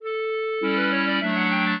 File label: minuet, clarinet; Mozart